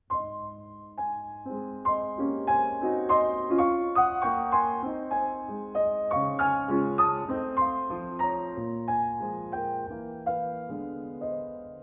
rags a-flat major andante

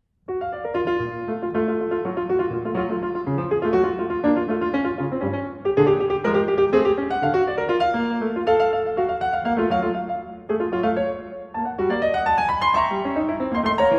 piano sonata

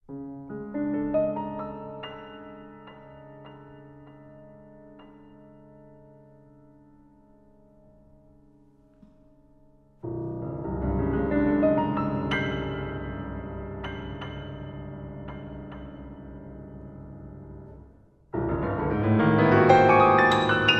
etude for piano

